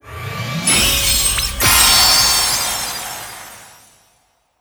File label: Topleague Rankup